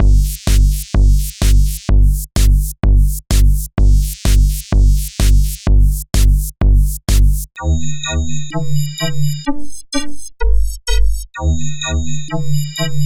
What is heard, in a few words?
House Beat; Extremum